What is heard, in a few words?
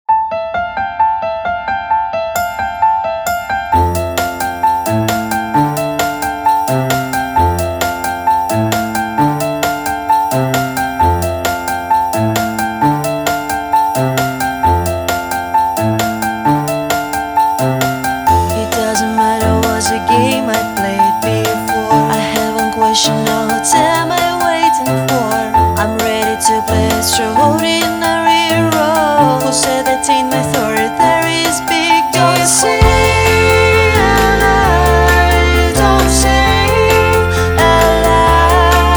Intoxicated Piano